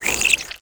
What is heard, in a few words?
Critters eating; SNKRX